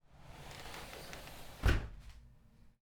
big glass sliding door open and closes; prosoundfx

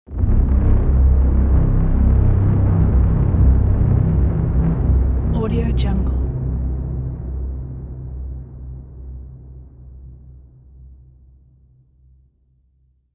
impact

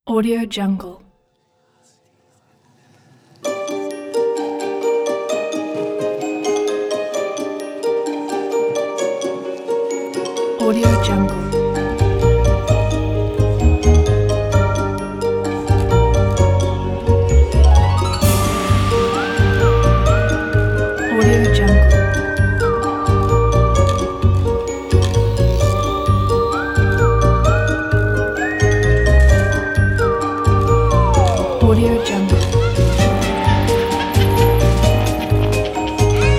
halloween orchestra

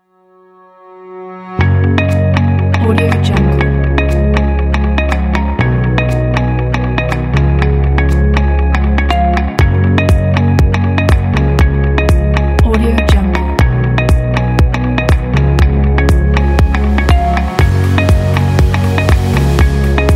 aspiring motivational ambient

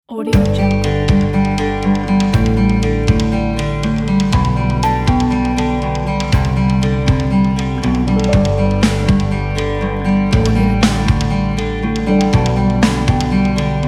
uplifting energetic acoustic indie folk

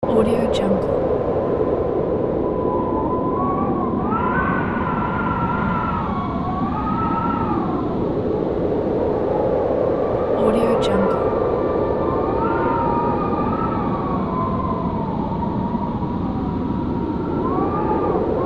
winter wind loops

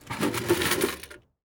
vending machine remove; prosoundfx

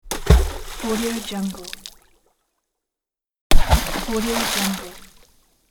water splash low

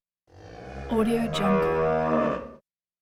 cow moo; kipaloops

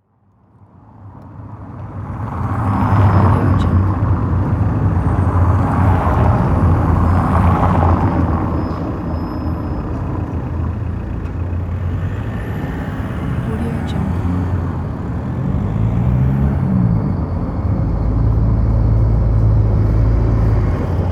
city ambience traffic

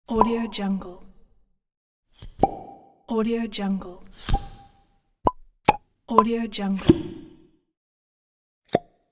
suction bubble poof pop ui

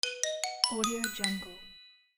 xylophone win

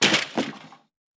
hit springboard; sound ideas

